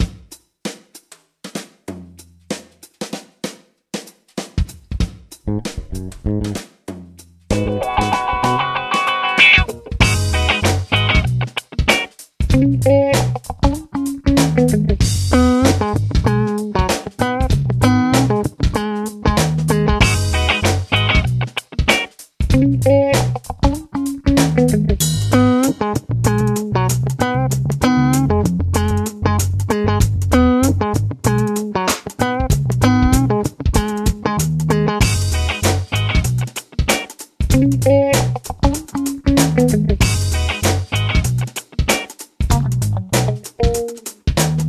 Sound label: funky; sarmacja